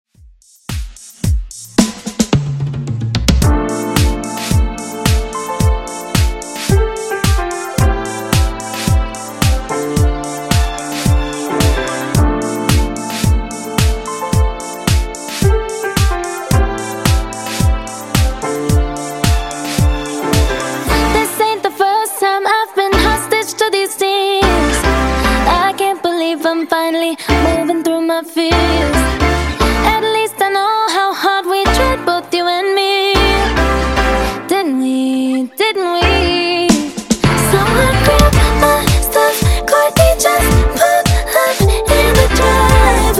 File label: Intro Outro Clean 110 Bpm